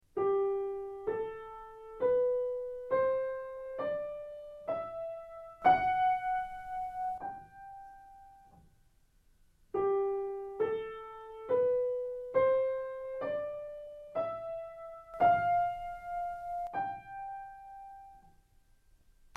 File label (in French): gamme puis mode